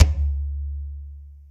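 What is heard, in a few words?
TOM loud; Zero-G; Chemical Beats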